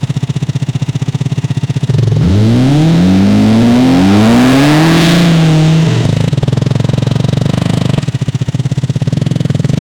sonido interno del motor, motorcycle

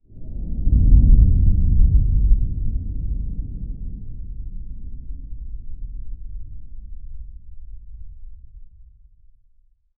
trueno lejano con cola

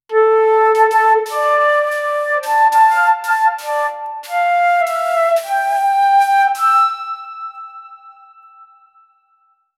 la meloda de la flauta

